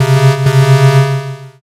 wrong

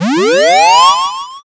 charge

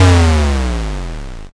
explosion m

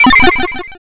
glitch

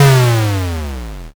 explosion l

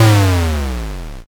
explosion m

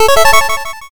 powerup

